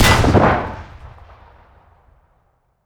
armor take damage; gmod; lvs framework

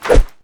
fist swing; tfa starwars melee weapons pack

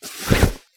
hammer draw; melee; tfa starwars melee weapons pack

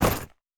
fireaxe draw; tfa starwars melee weapons pack

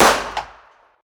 fire dist int pistol light; gmod; arccw uc commons attachments

